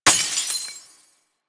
Vial Glass Shatter; elements; Warframe; Warframes; Alchemist

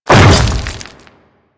Hammer Boss Step Dirt, Footsteps

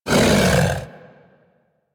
Feral Vocal End Snarl; Warframe; Kubrow

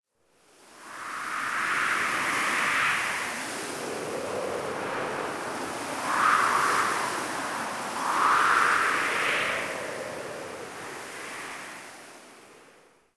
Wind Gust, Camp; Quests; The New War; Ambience; Drifter Camp

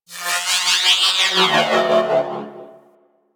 Robot Metallic Textures, Ambience; Warframe; Venus Open World; Objective Rooms

